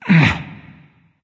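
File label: nonverbal grunt lift; smite; voicepack; ullr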